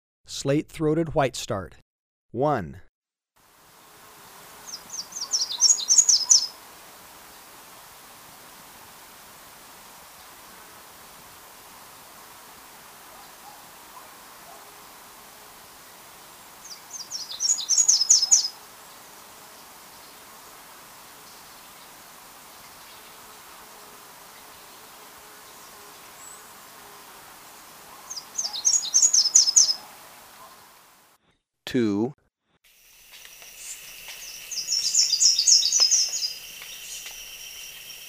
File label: Slate-throated Whitestart, Birds of Ecuador; S. America